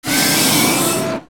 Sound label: Volvagia Scream Hurt; Zelda; OOT